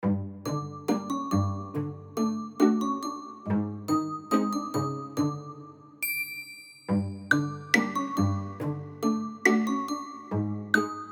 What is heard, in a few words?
cartoon pizz and sneaky suspense kids